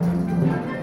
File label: Music Menu 144bpm Start